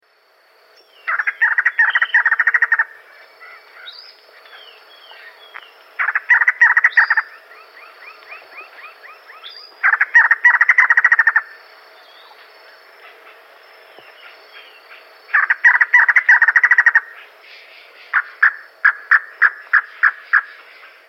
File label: Bananal Antbird; S. America